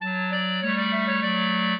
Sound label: minuet, clarinet; Mozart